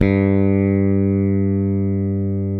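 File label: SB G1 HARD, SPECTOR BASS; Northstar - New Gold VOL-1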